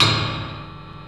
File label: METAL, PRC METAL & WOOD; Roland - LA Composer vol. 1